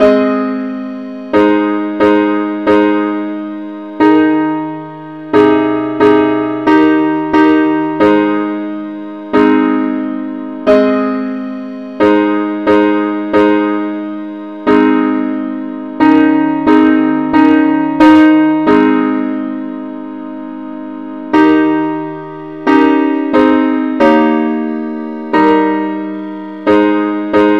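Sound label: parts only key a flat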